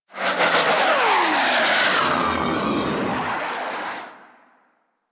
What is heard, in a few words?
Fighter Ship Attack Maneuver B, Space Fighter Ship Engine; Warframe; Railjack; Enemy Ships